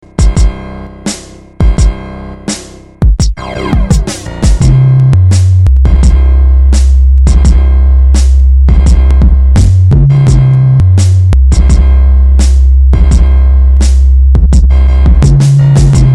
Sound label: Bass